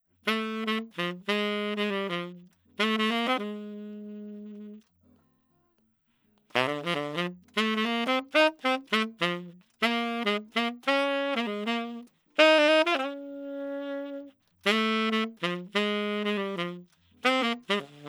Walking saxophone Left Center Right Center

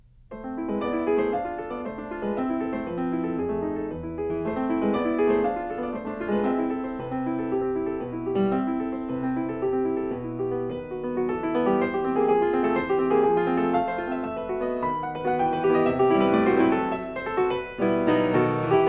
Song Without Words for piano in A minor

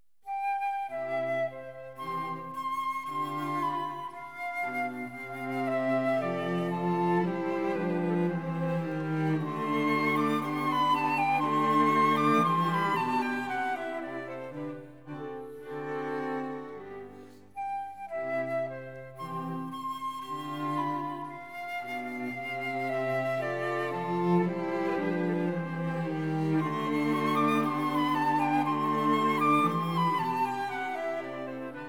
flute, violin, viola & cello; Quartet; No． 3 in C major, K． Anh． 171 (K． 285b); Mozart; The Flute Quartets

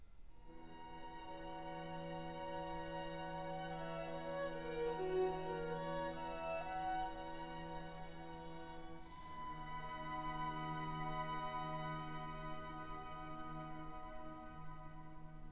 Concerto in E major, II. Adagio

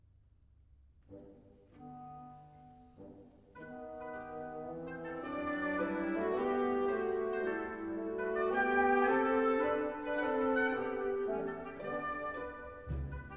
Enigma Variations, Allegretto; Elgar; III. (R.B.T.)

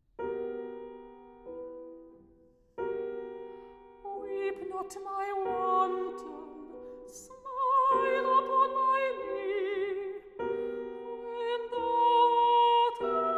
song cycle for mezzo-soprano & piano; A Charm of Lullabies; 3. Sephestia's Lullaby

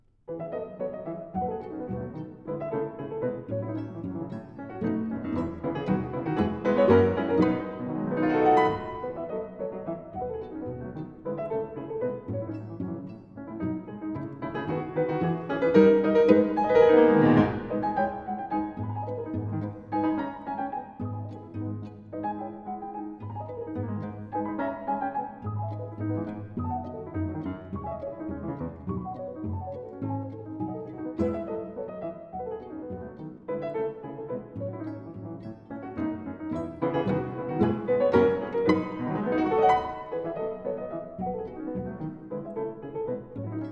Piano Trio in A minor: Andante con moto

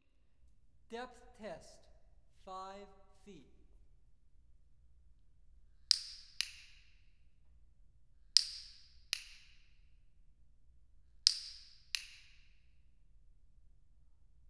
Depth of Image, Acoustic Clicker: 5 Feet

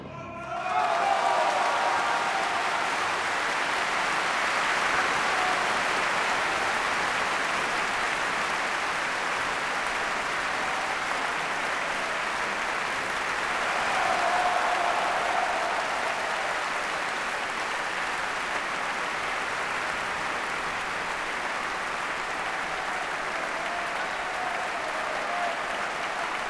Applause; Mahler; Symphony No 7

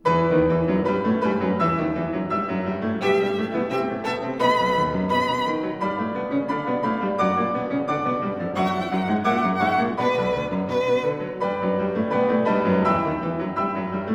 Variations for flute & piano in E minor

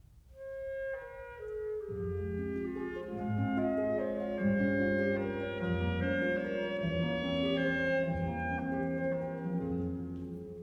for voice & piano; Malinconia, ninfa gentile; (6 ariette da camera)

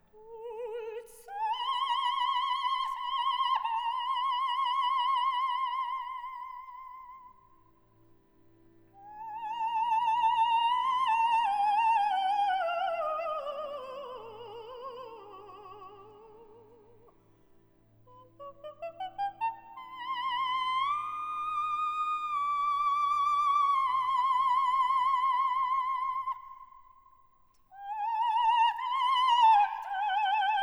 scenic cantata for soloists, choruses & orchestra; Orff; Carmina Burana; Part 3． Cour d'Amours

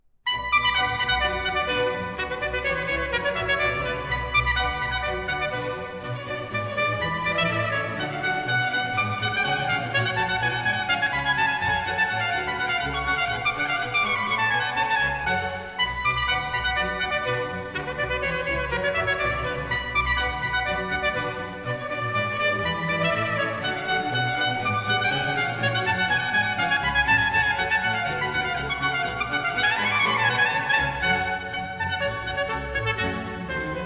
Orchestral Suite in B minor; BWV 1067; Badinerie

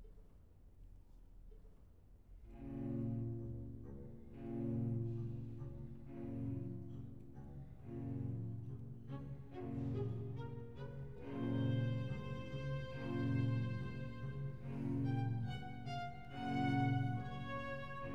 Preludes Moderato

